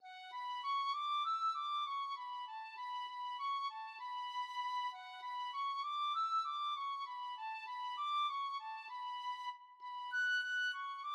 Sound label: tin whistle